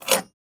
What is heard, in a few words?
eoka pistol rock scrape; Rust